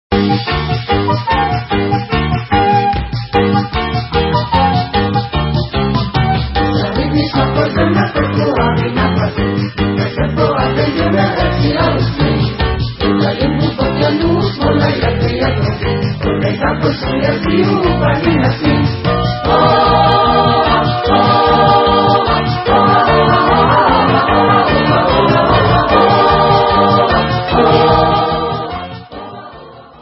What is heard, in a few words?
Hora Vocal